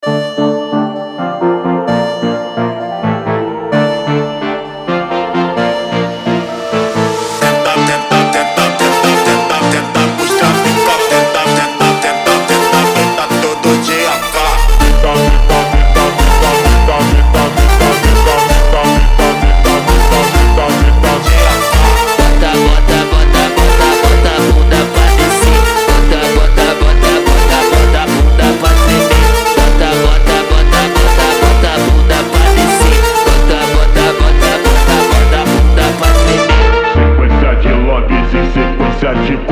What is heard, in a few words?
music